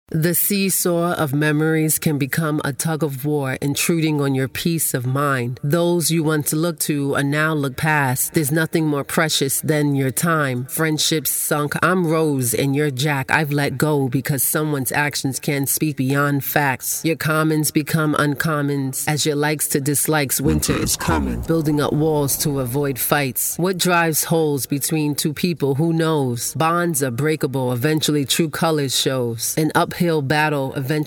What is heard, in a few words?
Acapella